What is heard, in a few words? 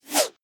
Sword Slash Energy Wave